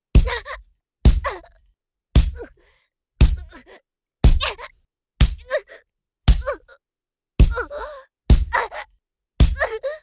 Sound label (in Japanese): 連続パンチ